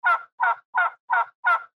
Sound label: turkey honks